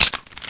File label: glock mag out